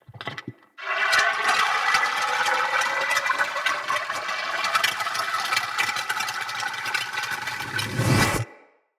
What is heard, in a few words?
Shrimp Lantern Burst Warning; Creatures; Baliq